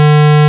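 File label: error, Digital / Game Sounds; Error Buzz; 0.5s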